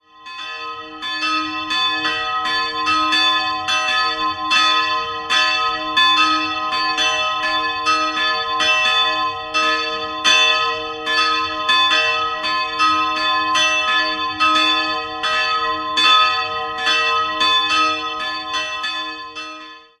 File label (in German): Glocken; Thannhausen EI